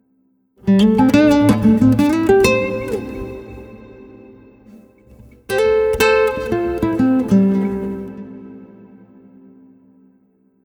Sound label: Acc Guitar Solo; соло